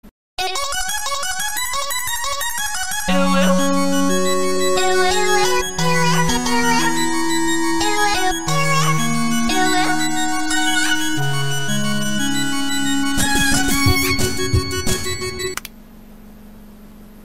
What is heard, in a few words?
UwU but in popular games Moon Theme Music; DuckTales